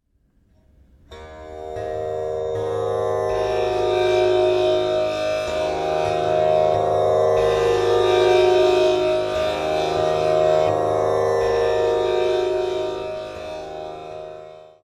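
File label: Tamboura A flat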